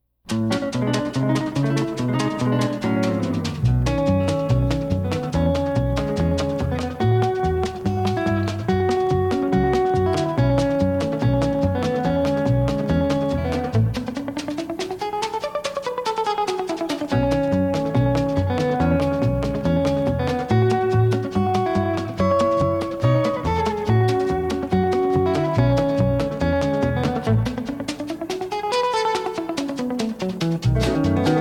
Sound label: Guitar